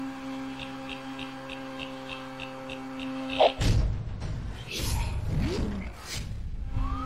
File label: radar detection; nfs hp 2010 gamescom